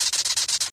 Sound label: charge; Voices Emotes